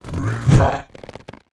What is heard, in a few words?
BLAZE BLOCK WAR ATTACK